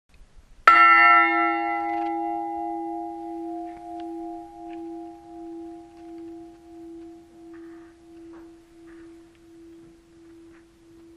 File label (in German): kapelle glocke; kneblinghausen kapelle glocke ursula